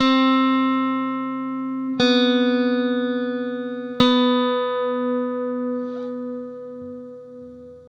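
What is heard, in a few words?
Slow Guitar Arpeggio; Guitars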